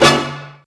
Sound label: Brassy Past; Mo' Phatt; Digital Sound Factory Mo' Phatt